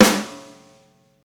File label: sparkle steel snare drum g key, acoustic snare tuned to the G note